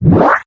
Marker Tag Place Marker; moul

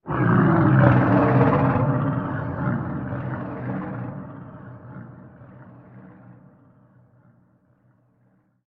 Creature

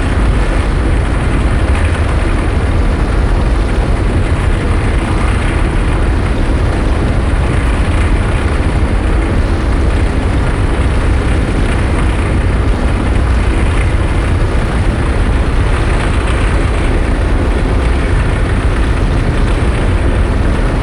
Gears Loop; moul

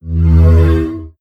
Engineer Hut Buttons

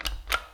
dbl click; Mirtahm; moul-assets

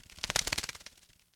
Bird Fly By; moul

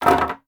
Plank Stn Hit; moul-assets

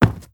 Soft Wood Run

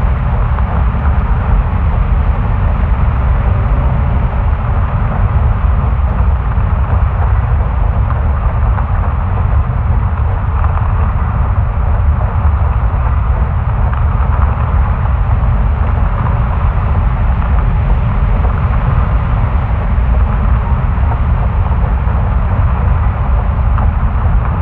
Exterior Rvlv Loop; moul-assets

